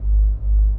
Mana recharge; magic

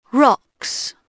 rocks; Dorling Kindersley My First Incredible Amazing Dictionary; SEES

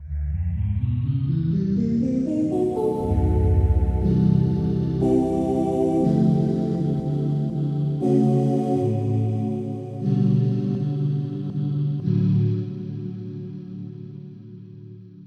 Synth Voice; FF7

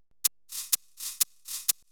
PERCLOOP HOUSE 125; eJay

